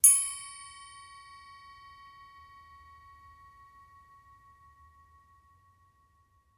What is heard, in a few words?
Elephant Bell, bells